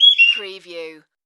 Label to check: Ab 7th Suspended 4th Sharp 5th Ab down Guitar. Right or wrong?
Wrong